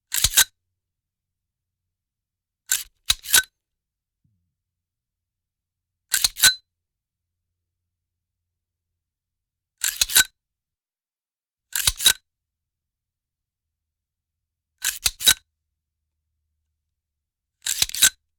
handling 50cal gun

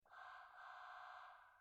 emergency siren distant

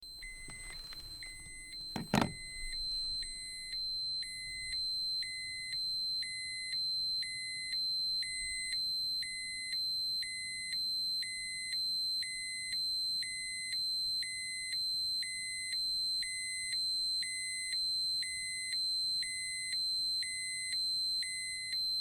dosimeter alarm

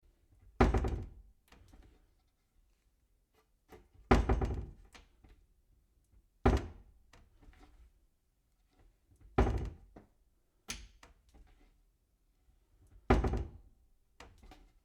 door cabinet, household

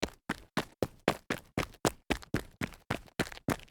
dress shoe run on gravel

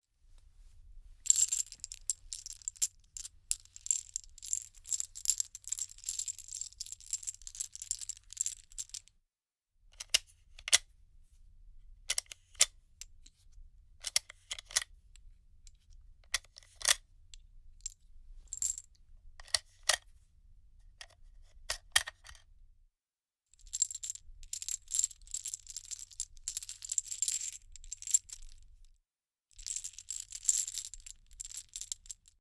handling bullets

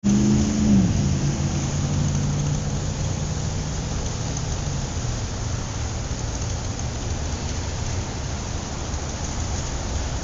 city traffic

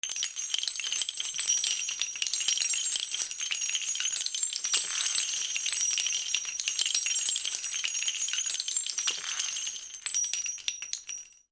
shell casings poured on cement

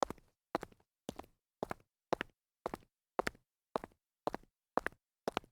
walk on concrete, foley

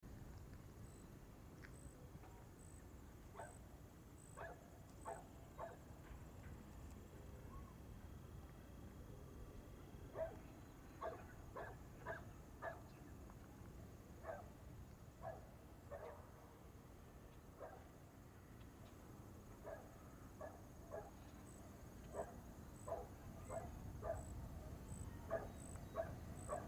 outdoor sounds with dog

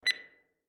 beep short, alarms

